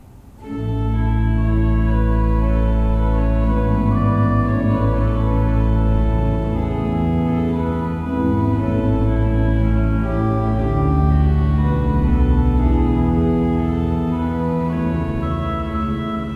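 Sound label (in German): Seifert Koeln, pfeifenorgeln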